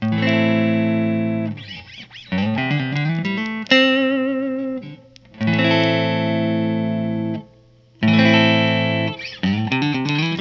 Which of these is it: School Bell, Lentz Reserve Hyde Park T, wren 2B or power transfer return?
Lentz Reserve Hyde Park T